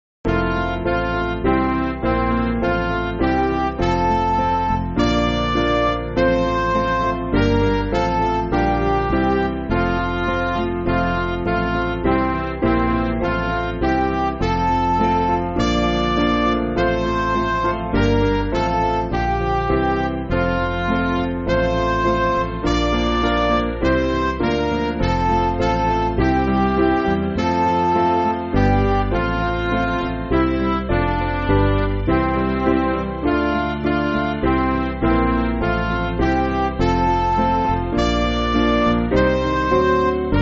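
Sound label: Midi, Music Backing Files for Churches; Ave Virgo Virginum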